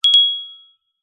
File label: multimedia notification bell chime ping ring tone